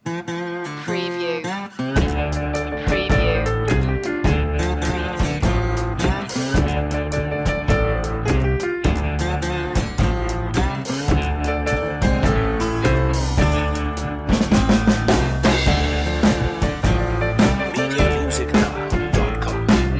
leroys blues

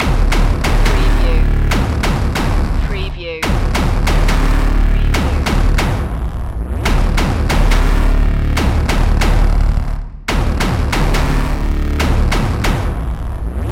binary clash loop

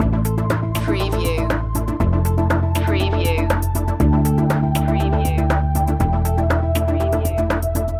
uploading loop

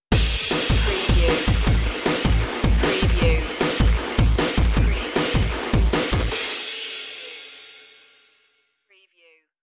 crash course stinger

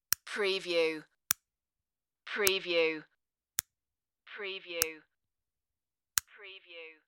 light switch

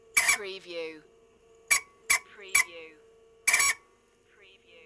Stuck cassette player squeaking; beanstalk audio

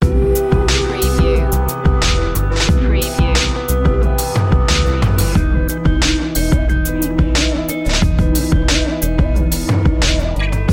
slow rock loop

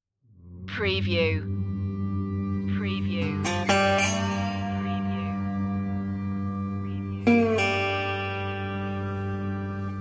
resonator guitar harmonica and hum

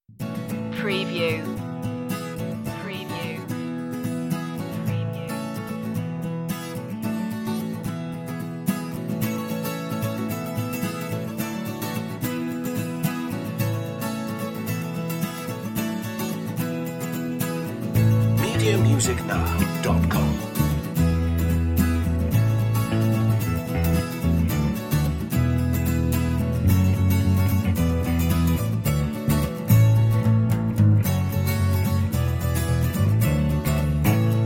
joyful people acoustic mix

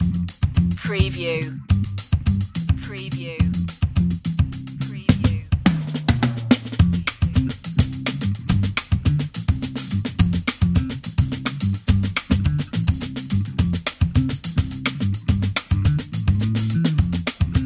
easy life bass and drums

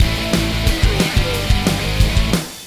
fireball loop a

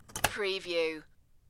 Cassette Open

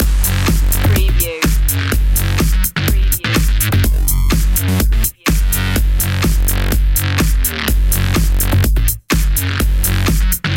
phunky beat loop

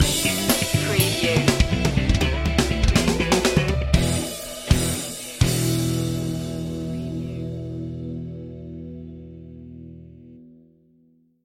walk in style stinger

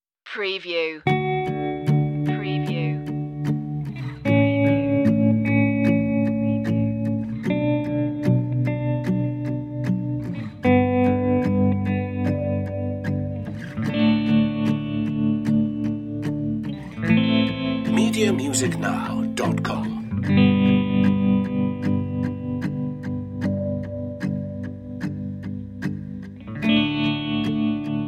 guitar with motion

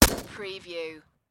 Machine Gun Sig SG5

